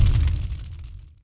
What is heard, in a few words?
flames